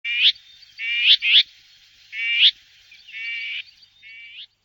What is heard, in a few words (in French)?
Fauvette grisette cri; mai an vii le royaume des tariers